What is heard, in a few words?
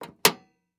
Sports Grill Metal Grill Cover Locking